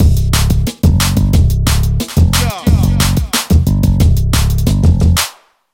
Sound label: Jump Up Jungle